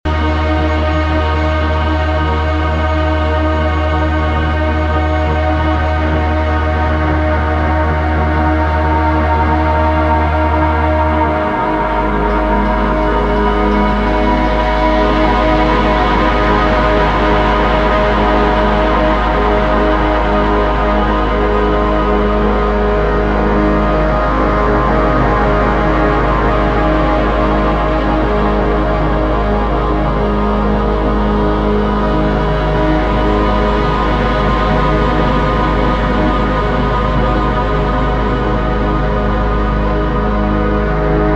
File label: Slow Oscillating Void